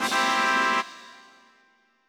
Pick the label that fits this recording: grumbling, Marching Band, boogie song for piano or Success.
Success